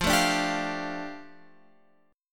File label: F 6th Add 9th, Guitar Open F; x,12,9,9,10,12; F6add9; strummed